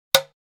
cartoon bonk; NCH Library

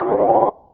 warning Lane; Space Wave Race

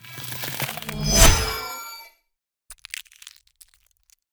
Ice Spell Whoosh, Cold Whooshes; Arctic Aura; FrostBuster

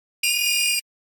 Alert Beep Sci-Fi; Hollywood Edge; sound designer's toolkit v2

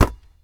Street Ball Rebound Pull; SFX Kit

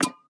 Peg Leg Footstep

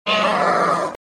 Velociraptor - Cry; RadwinIsland

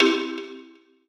PUZZLE Success Xylophone Stab Wet; stereo; Universal Sound FX

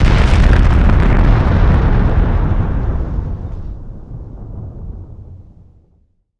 Explo Large Atomic; Destruction

